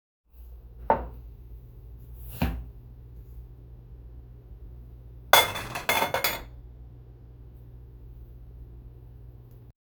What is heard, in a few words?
evening kitchen ambience